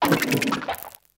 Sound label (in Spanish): Grito de Nacli